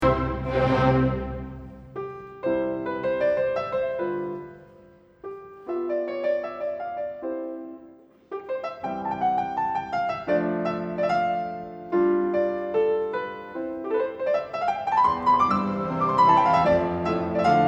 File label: Piano Concerto Alegro; Beethoven; Piano Concerto 1